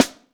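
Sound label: snare, EMU Orbit-3 drum machine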